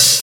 Smooth Open Hi Hat Sample D# Key, Royality free open hi hat sound tuned to the D# note